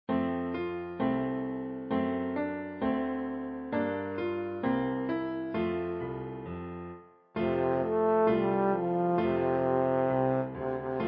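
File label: trombone, für Posaune und Klavier; Jingle Bells; Schwierigkeitsgrad Klavier Level 4, mittelschwer, mit Vorspiel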